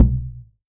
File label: CHANG GO, KOREAN DRUMS